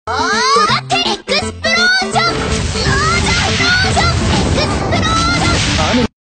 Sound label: explosion; megumin